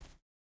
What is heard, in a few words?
footstep barefoot concrete jump start; Rust